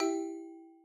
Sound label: reward